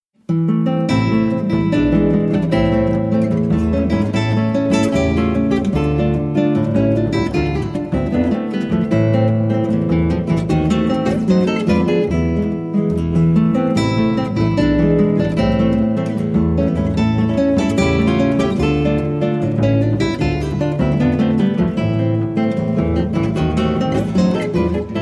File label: Choros